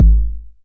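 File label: 909 BD tape, kick drum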